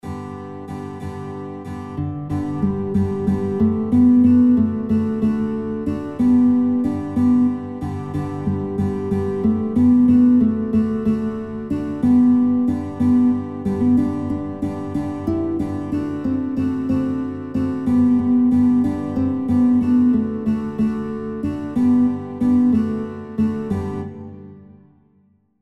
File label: Guitar, kinderlieder gitarre playalongs; Guitar Songbook; Es Klappert Die Muehle Am Rauschenden Bach